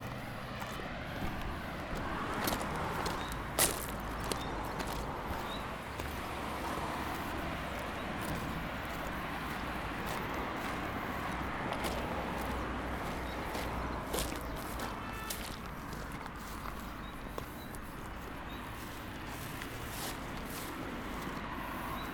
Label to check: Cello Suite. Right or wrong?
Wrong